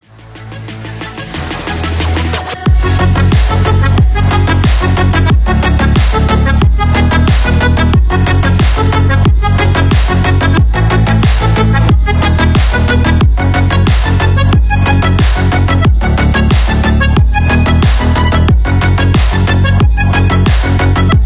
accordion car house

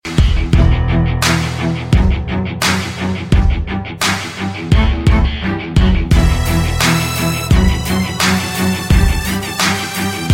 hip hop

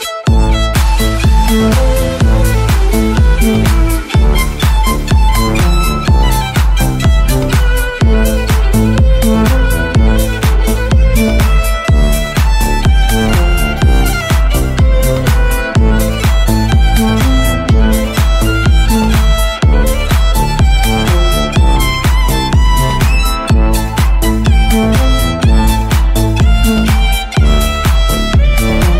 violin song remix

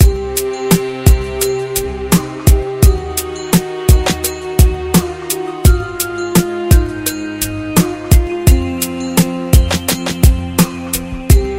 arab beat

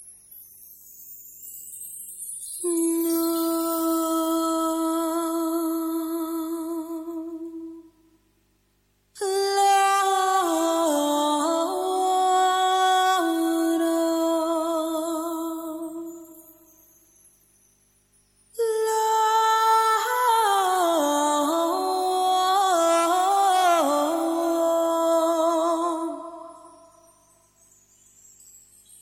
humming; ringtone

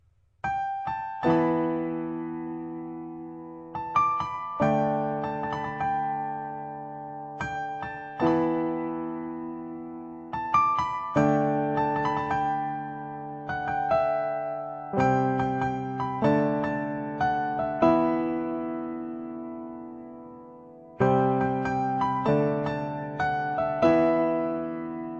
piano

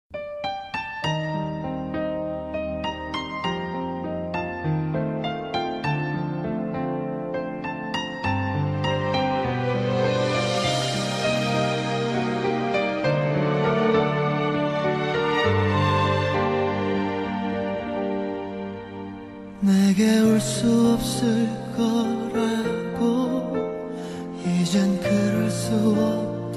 piano

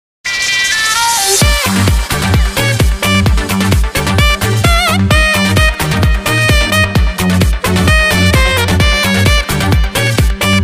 orchestra sax; ringtone

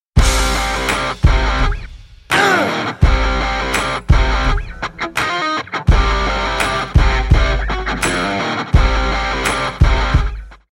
instr

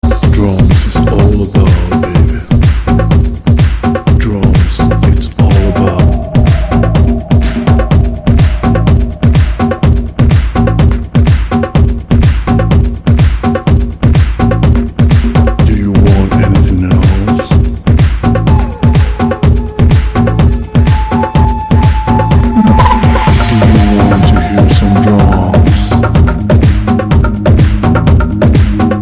underground drums

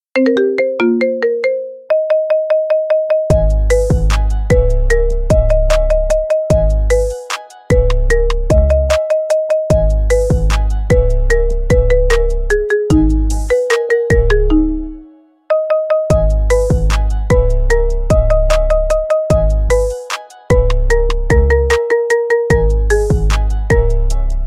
marimba remix

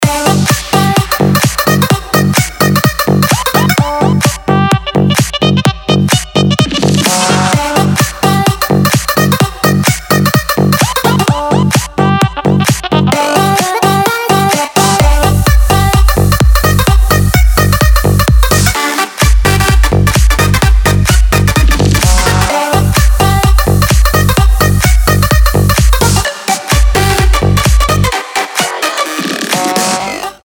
trumpet

